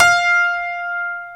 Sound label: StereoGrand, Keyboards